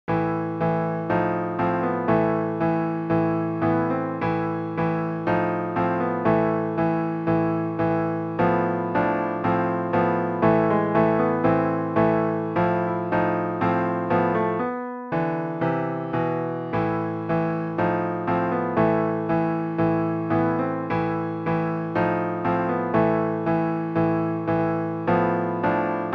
march slav

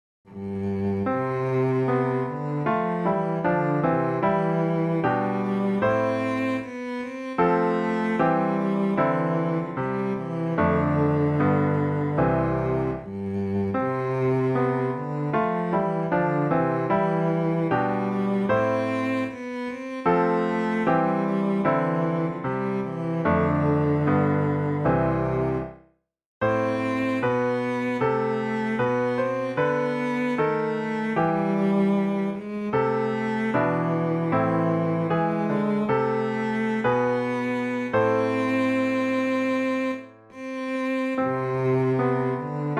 cello

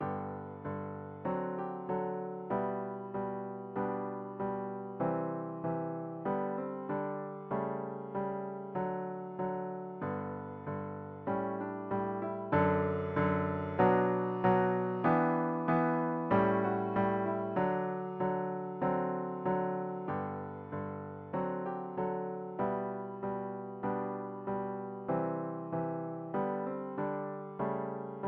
pomp and circumstance piano

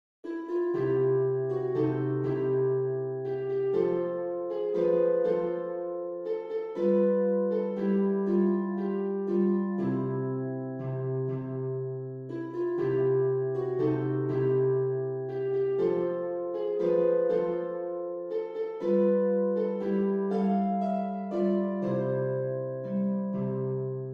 harp

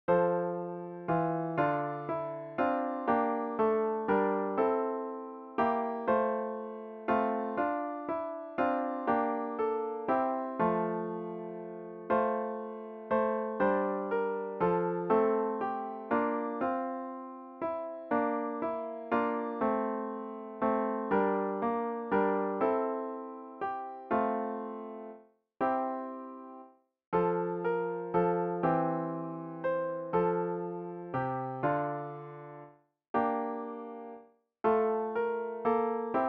piano solo

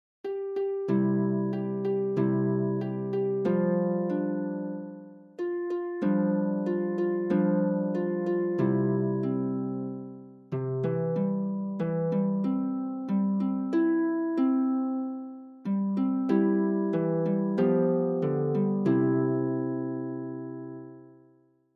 harp

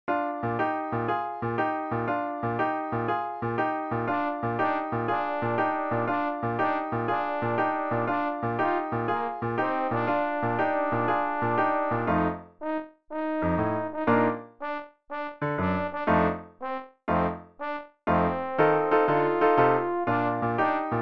boogie trombone solo; jingle

